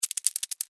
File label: Spider Run Stop, Spider, Run, Stop, Scurry, Movement, Arachnid, Insect, Bug; Animals:Insects; Spiders; Blastwave